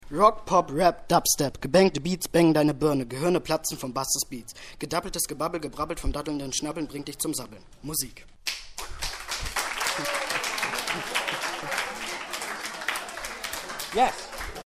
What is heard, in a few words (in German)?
musik